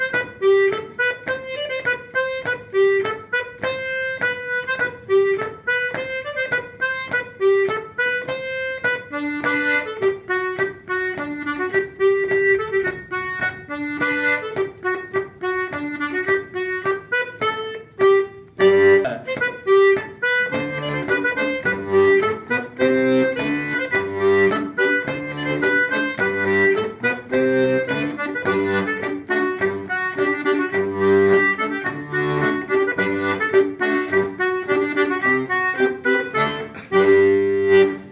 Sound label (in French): Voix 3; Rondeaux à Léa; Musique d-ensemble